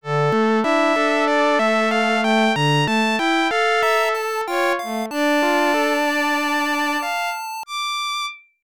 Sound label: Defeat; Nobunaga no Yabou Bushou Fuuunroku; Genesis